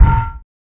Tube Kick; FREE SFX PACK VOL 3

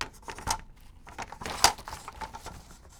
Plaster Clatter; Micro Pack; Paper Cutter

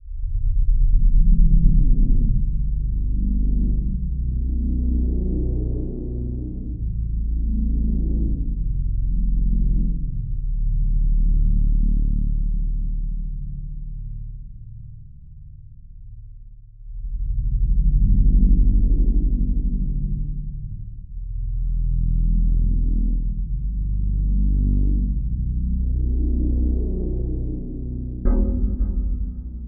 Dark Pluck Loopable, Horror; Music; Horror Sound Pack

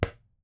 click

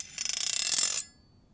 Serrated Knife - Scrape, kitchen knives; Micro Pack

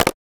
UI Press; FREE SFX PACK VOL 3

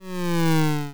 square missile fall; Square Waveform sfx

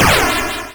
explosion, Retro Sounds 32-Bit

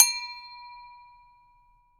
Bell Ring; FREE SFX PACK VOL 2

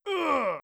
HurtPlayer; Basic Game Sound Effects Pack 1

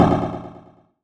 Retro FootStep Metal

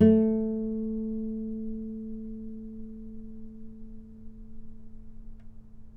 A3 - Pluck, novice cello

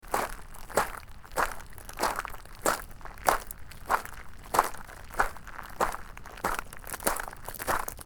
Gravel Footsteps; horror; horror sfx vol 2

